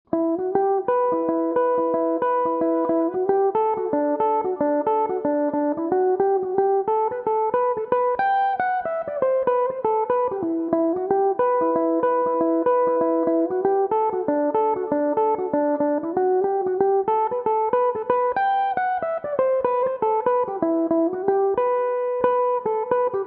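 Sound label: E minor, irish mandolin, tunes; day after the fair; belmuse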